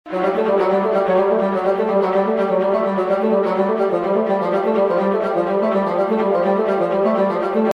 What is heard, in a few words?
orchestration doublings bassoon violas, Violas + bassoon; epic orchestral track